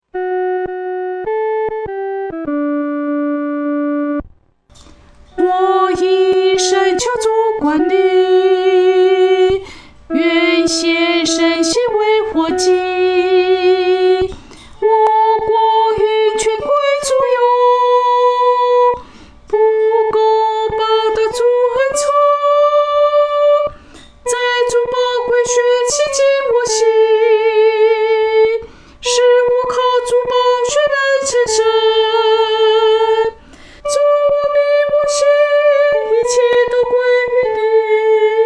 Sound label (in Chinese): 独唱 第一声